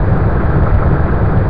hum; Quake; PainKeep

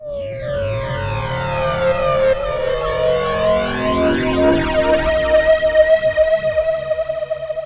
synth; Evolution

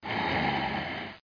snore; dogz

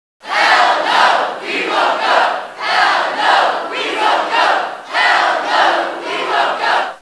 hell no, crowds chants; Kaboom! Junior

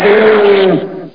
Garg pain

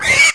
Hit; PC Gamer IT CD 45; SMAN DEMO; baddys; brutal

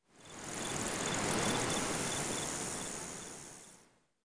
CRICK, nature; PC Gamer IT CD 40; zones

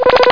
super zap

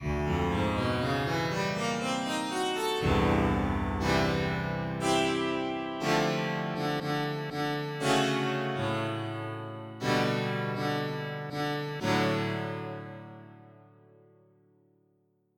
Hammered, dulcimrs

